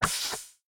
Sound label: gate; factorio; Factorio 0.14.21